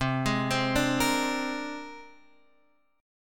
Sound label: C 7th Suspended 2nd Sharp 5th, C, down, Guitar, Drop D